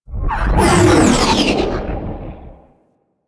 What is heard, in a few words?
Sentient Ship Close Short Fly By; Warframe; Enemy Ships; Space Fighter Sentient Coil Agent